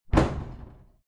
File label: cupboard kitchen close hard